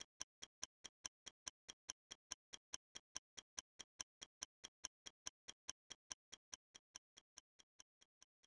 Tick Tock; Super Off Road The Baja 1993 SNES

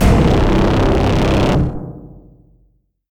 Fail

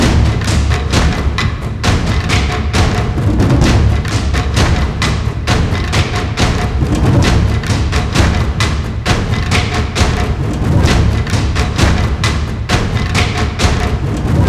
Drums

